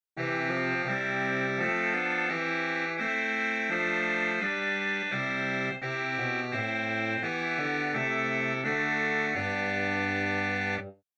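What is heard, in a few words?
Positif Hautbois, organ; Romanswiller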